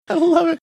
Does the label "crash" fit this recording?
No